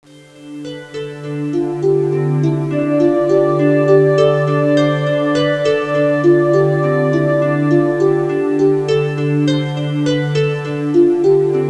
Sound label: Jasmine Rain Mono 8-bit